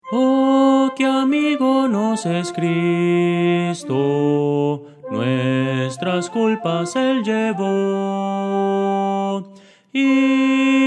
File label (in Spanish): soprano; oh que amigo nos es cristo